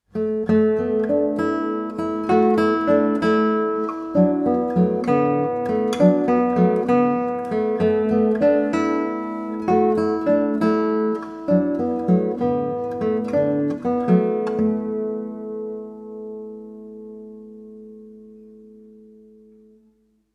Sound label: Pentatonic Piece